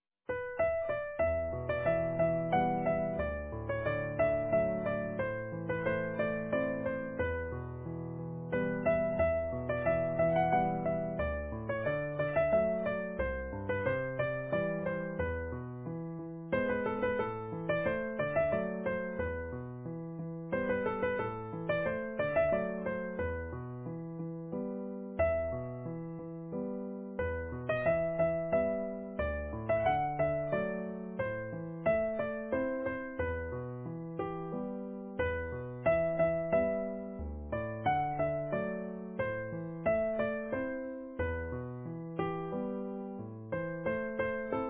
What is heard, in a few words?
Easy Piano